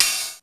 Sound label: RIDE ANALOG; Rubber Chicken Software Sample Bank; DRUMS + PERC